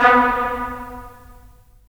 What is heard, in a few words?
BRS, Tps Stacc; Roland - LA Composer vol. 4